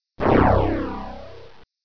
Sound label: timeout